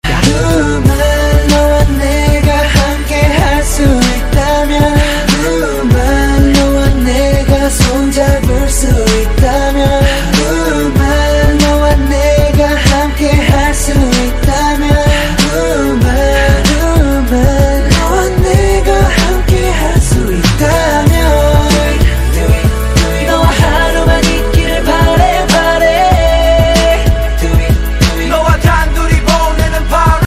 korean ringtone

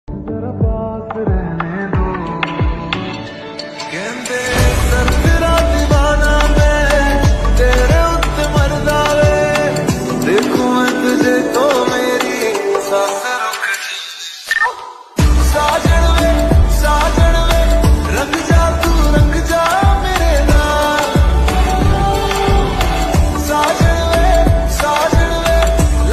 lofi hindi ringtone

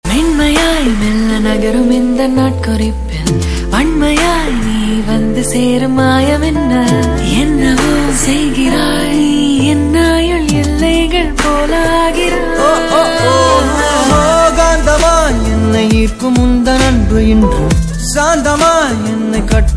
tamil ringtone

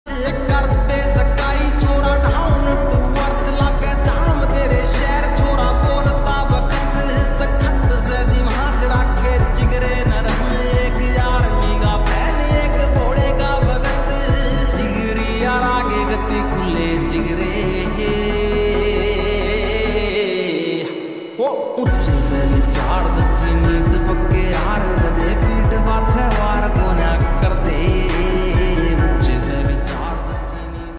slowed reverb haryanvi ringtone

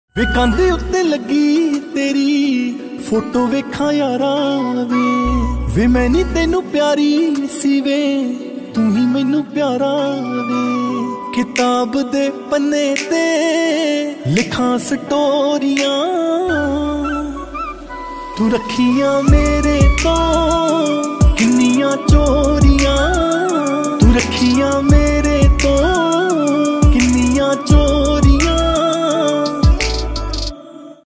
Punjabi Song Ringtone

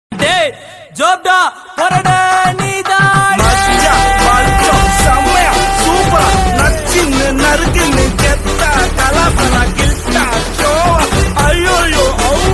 tamil ringtone

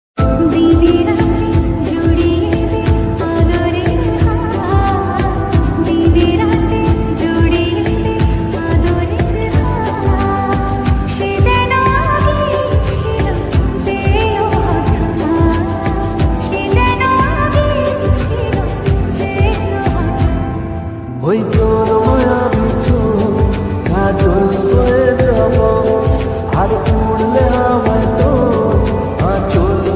bengali ringtone

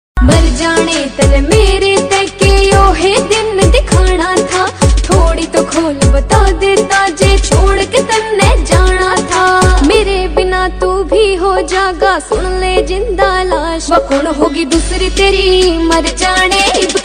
haryanvi ringtone

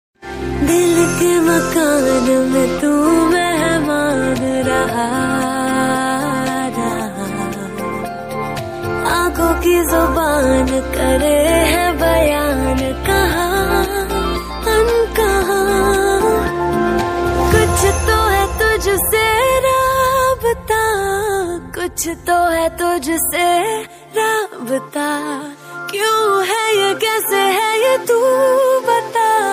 Title, hindi ringtone

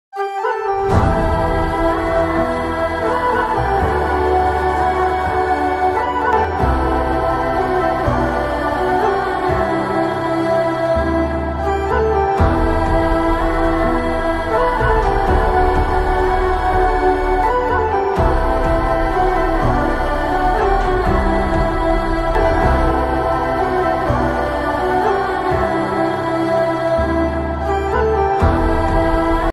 BGM ringtone

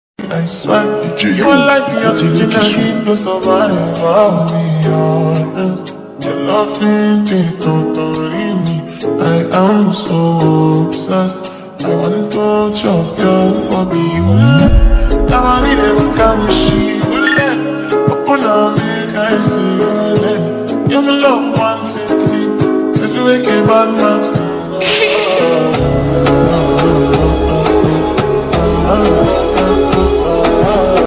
Remix english ringtone

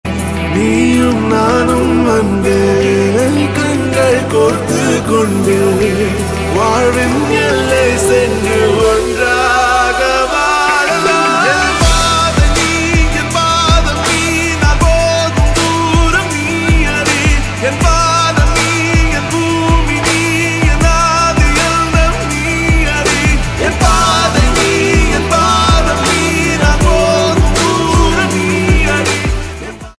tamil ringtone

